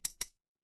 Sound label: glass glass tap